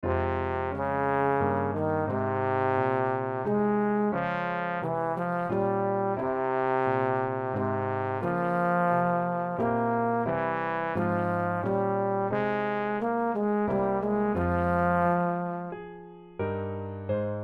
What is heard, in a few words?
Trombone Minuet